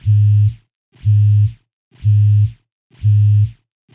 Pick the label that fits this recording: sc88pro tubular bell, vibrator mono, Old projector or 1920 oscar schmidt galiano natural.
vibrator mono